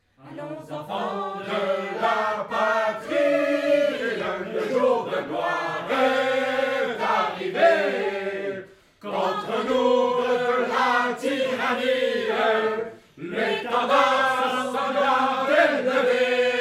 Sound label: Choir; Assassin's Creed Unity